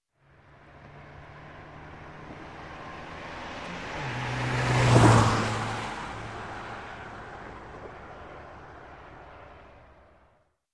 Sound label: Toyota Car; Sound Ideas; Series 2000